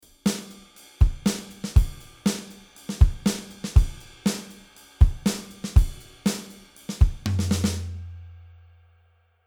Drummer API-2520 Color Off 60Hz 3dB Bell 8kHz 2dB Shelf, Artistic EQ; Fredenstein